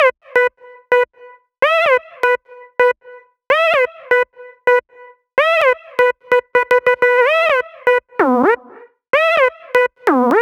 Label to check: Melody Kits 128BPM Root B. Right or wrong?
Right